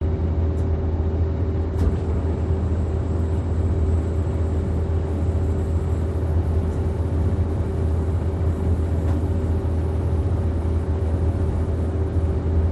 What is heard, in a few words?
Train Renfe Spain Idle AC; PMSFX